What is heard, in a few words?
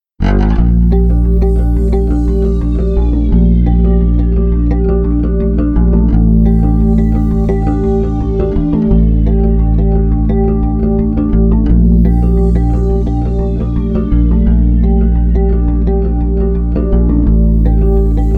me-20b patch; Expansive sound with chorus and delay.; ME-20B Bank 1, No. 2